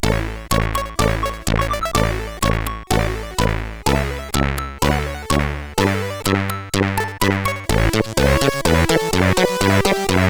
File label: Fanfare, sid CSG8580R5